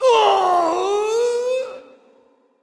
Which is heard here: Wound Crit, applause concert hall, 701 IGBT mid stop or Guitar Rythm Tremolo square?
Wound Crit